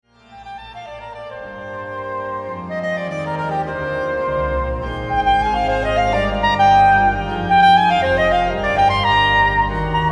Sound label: Dialogue I Allegro